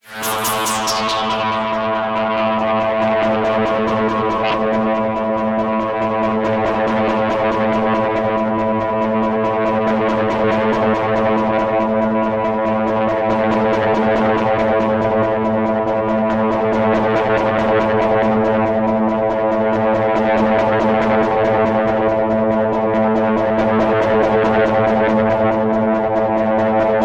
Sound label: SYNTH